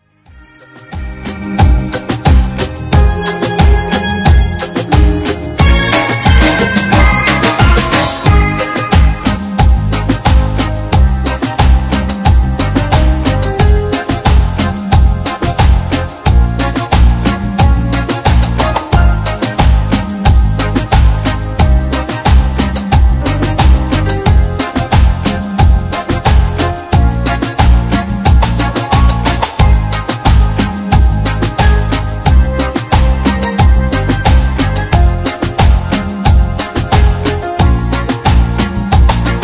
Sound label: Reggae Cumbia; No Cori